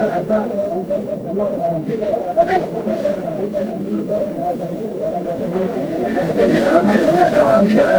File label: crowd murmur